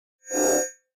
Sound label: Mouse Over Elect, mouse over